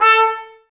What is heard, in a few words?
BRS TPS, Brass; 90 s Sample CDs; Miroslav Vitous; Woodwind Brass Ensemble